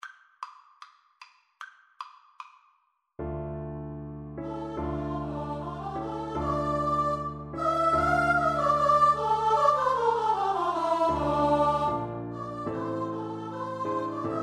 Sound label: VOICE duet